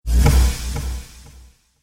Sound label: Cinematic Hit, Trailer Sound Effects